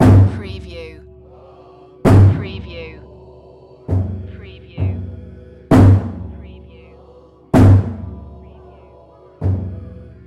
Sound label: Temple Monk Chanting Tokyo; Zinjo ji